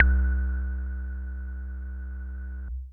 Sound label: SYNTH Bloomy Low